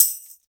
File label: Tambourine; Percussion